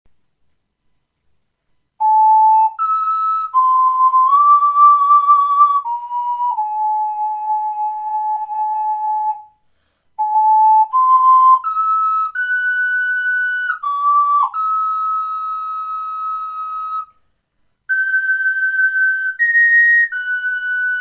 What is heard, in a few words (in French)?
melodie de la flute du spectre soprano, ocarina